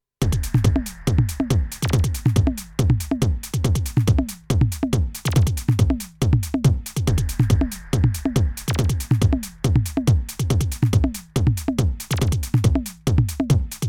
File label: Korg drumlogue Changes